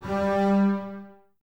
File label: Basses G 3, String Ensembles; Miroslav Vitous; LINKS ORCH